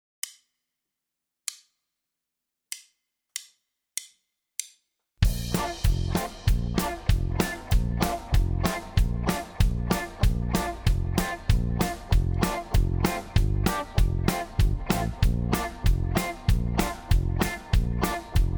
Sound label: Ringtones (duet) - play along